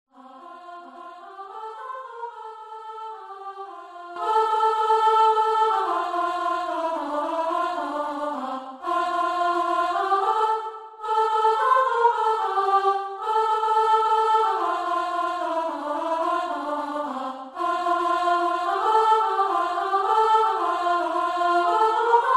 Verse + Chorus All

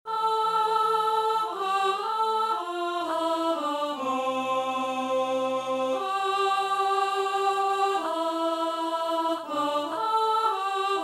alto

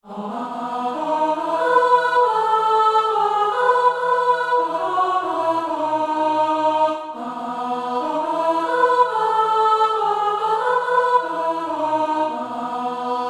Chorus Alto

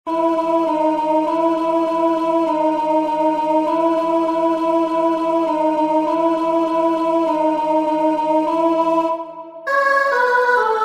Hark the hour Alto

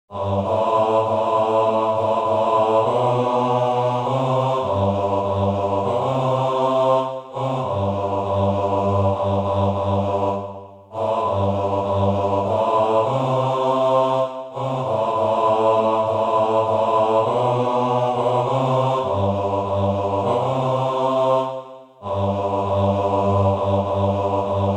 Bass

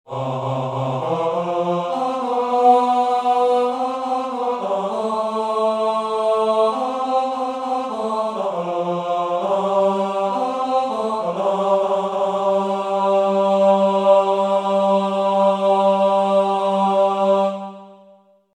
Tenor

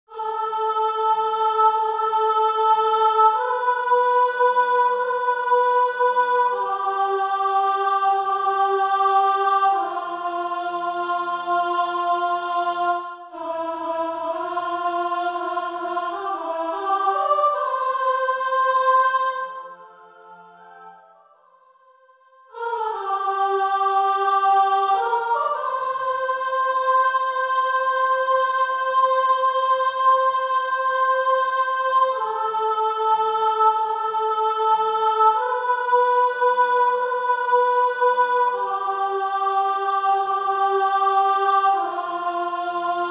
Sop

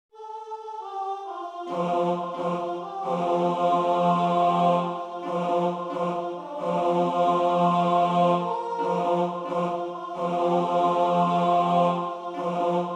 Bass

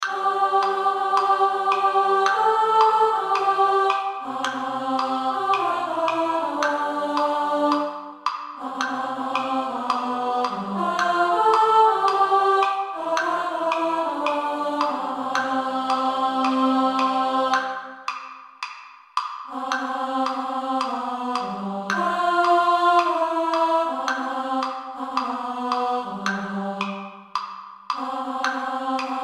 Women Sop

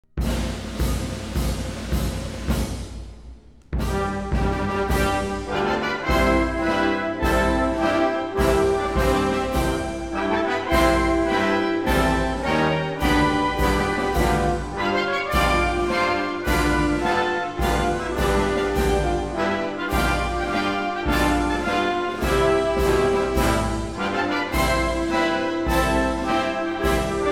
(Instrumental)

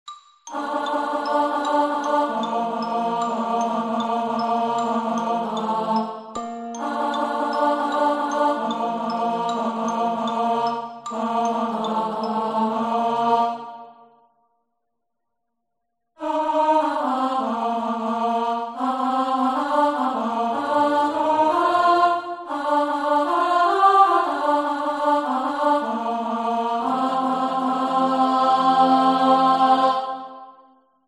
Alto

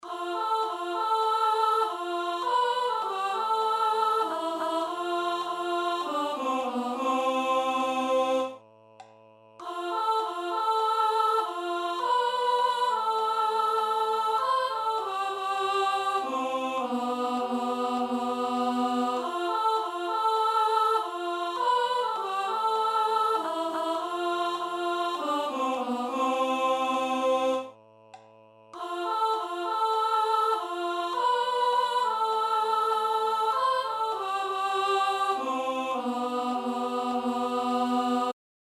Alto

